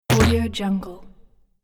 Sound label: game multi hits